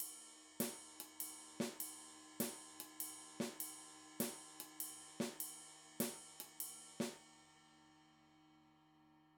Swing in 3

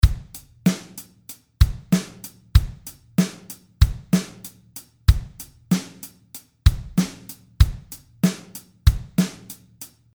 Hip-Hop Drum Beat

